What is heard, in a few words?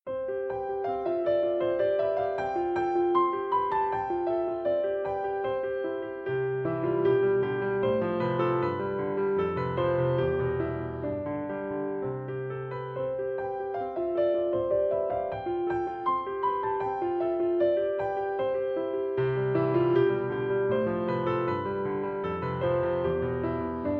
Children Song